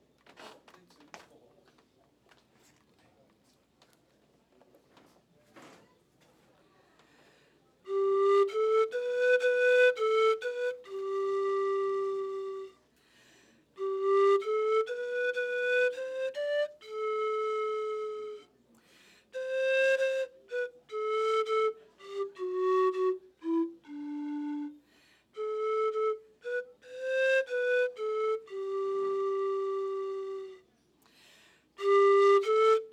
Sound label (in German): Panflöten